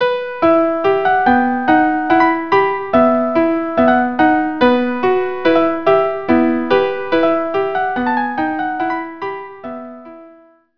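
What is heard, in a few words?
violin viola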